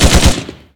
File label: Machine Gun Burst; Weapons